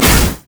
mage chains; LoL; ironforge xerath

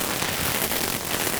fireworks recall loop; Fireworks Corki

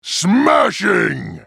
attack; Trundle

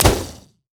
sad mummy bandage toss; LoL; amumu

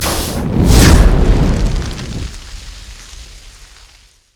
death; LoL; brand

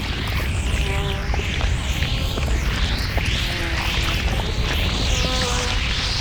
decayed loop; LoL; yorick

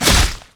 basic attack; LoL; Nocturne

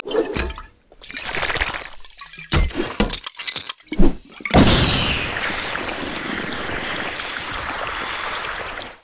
recall leadin; LoL; jinx skin01

